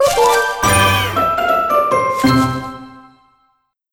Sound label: Crunchy Finish